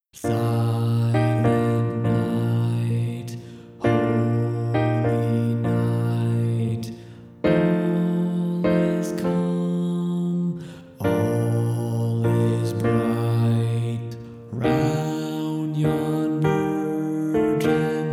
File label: Silent Night Bass; christmas choir rehearsal tracks